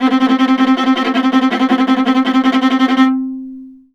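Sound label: VIOLA tremolo C3; Orchestral; DirectWave; Varazdin Orchestral; Viola II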